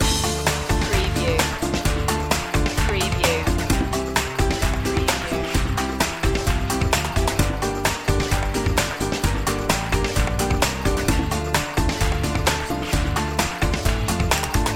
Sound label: festejar loop